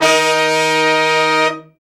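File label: brass section; BRA SECTIONS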